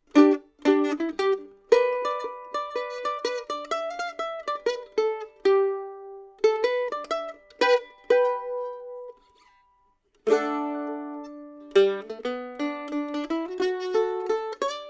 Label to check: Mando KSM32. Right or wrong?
Right